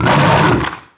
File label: mau attack; DAoC; Toa; Gamestar